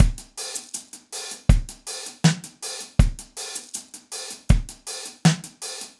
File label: beat, reggae loops